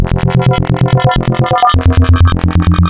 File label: Spectral, wavetables; Architecture 32